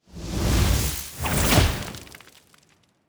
Fire Spell, Magic Sounds; Fantasy Sounds Bundle

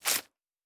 Stab, Medieval Combat Sounds; Fantasy Sounds Bundle

